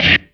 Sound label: Lofi; Lofi Special; Drum Hits; Snares; Nu Dance Electro